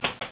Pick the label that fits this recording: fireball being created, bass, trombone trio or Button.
Button